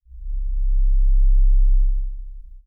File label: basy C1